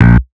bass staccato; osu

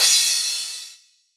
soft hit finish; osu

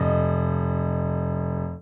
soft hitwhistle

